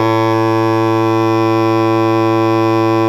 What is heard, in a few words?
BASS SHAWM; SoundBytes - Early Patches